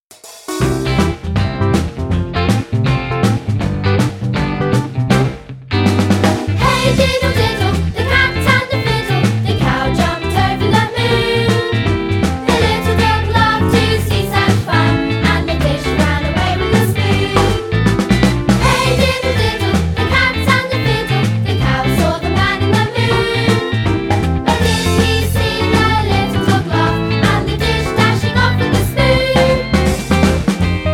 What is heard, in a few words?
Hey Diddle Diddle Vocals